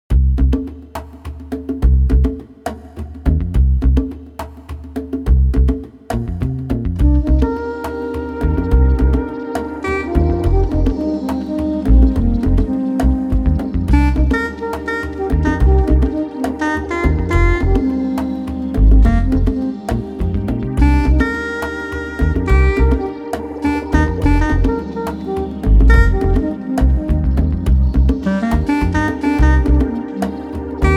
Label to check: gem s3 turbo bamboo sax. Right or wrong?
Right